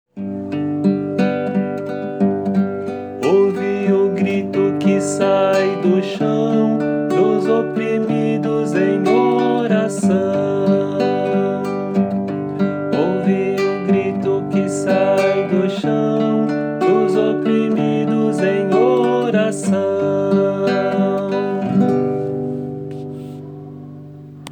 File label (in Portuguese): Ouve o grito